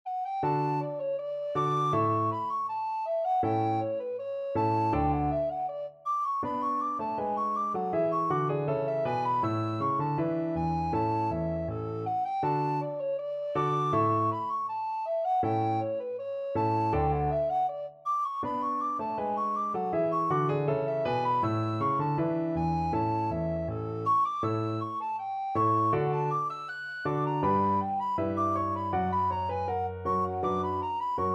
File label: bach bourree, alto recorder